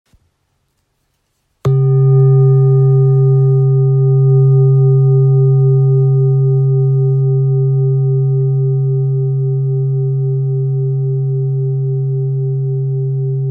Bol grave arbre vie re diese 2kg

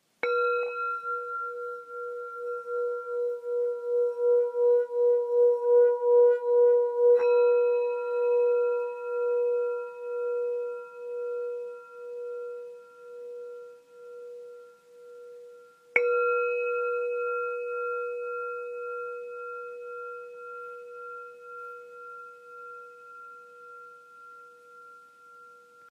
Bol bengale mi

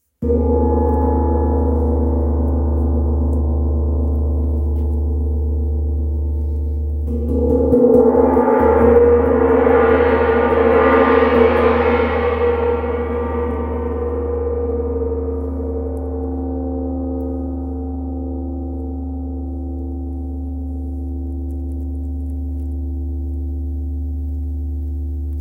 Chau gong 60cm ré

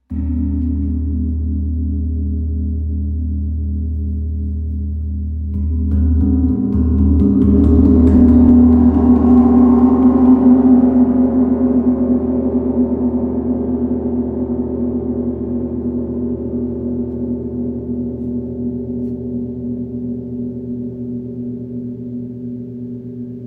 Chau gong 90cm re diese

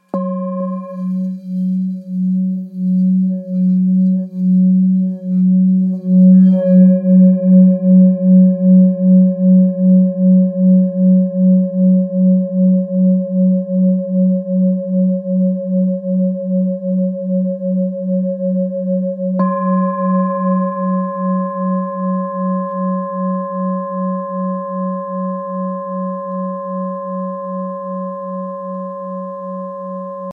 Bol thick fa diese

